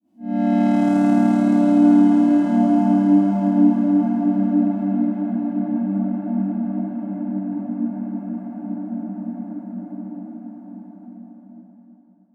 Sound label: Synth; EmptyUniverse; H90